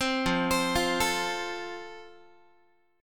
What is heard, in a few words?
F Major, Guitar; arpeggiated; chord